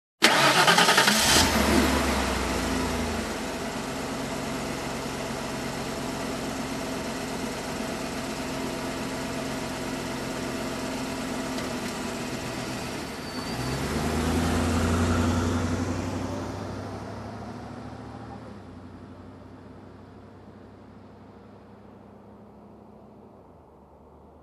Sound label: Car engine ignition and drive off